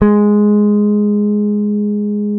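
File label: P BASS SUSTAIN G#3; Sampleheads; Will Lee Bass Library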